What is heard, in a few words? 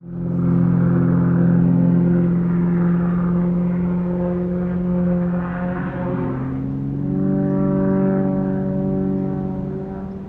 aircraft prop plane unknown type acrobatics air show